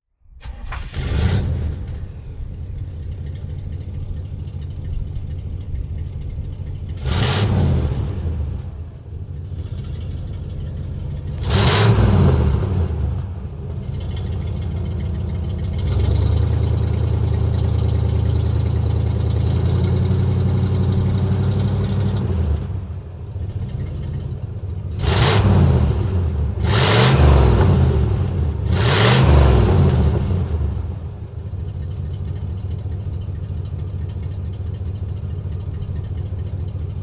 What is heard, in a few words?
Cadillac Fleetwood Sixty Special, oldtimer; Start/Stop